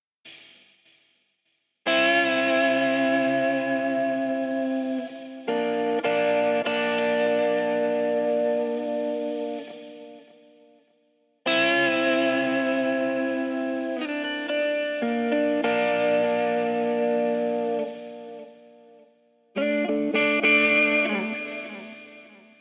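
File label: Gm 100 guitar impro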